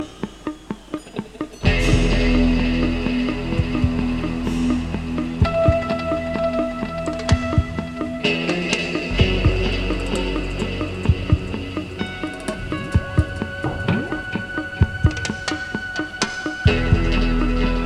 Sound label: Time intro; Torino, Italy